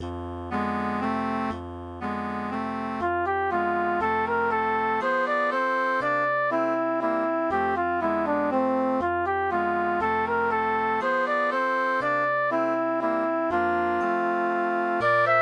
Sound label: Zither Carol